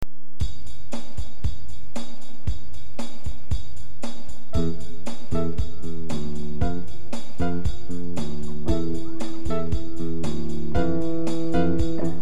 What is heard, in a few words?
E Phrygian Jam